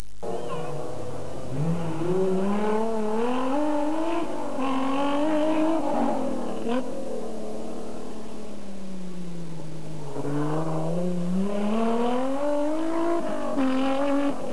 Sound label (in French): bmw 635 csi, le gros 3,5 litres fait du bruit